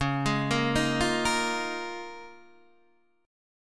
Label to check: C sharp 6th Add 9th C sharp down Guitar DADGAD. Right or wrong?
Right